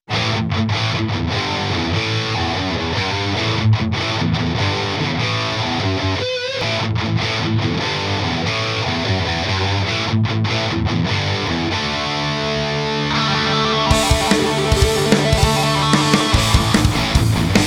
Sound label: in the dead of winter instrumental